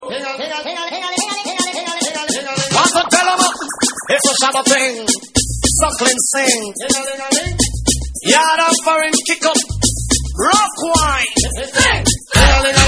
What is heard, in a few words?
Ole Skool Reggae Session; Kick Back In Style